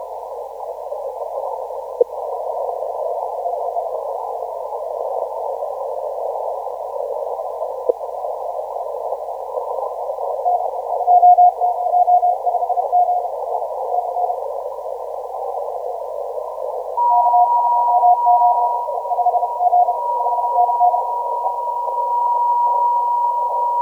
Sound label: 20m; VK9